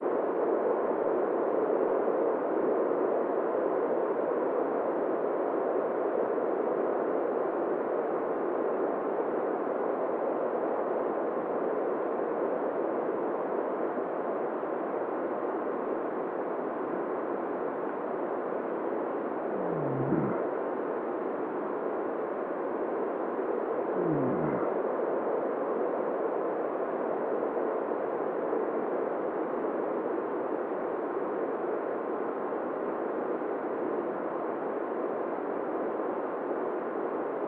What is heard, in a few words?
nite sounds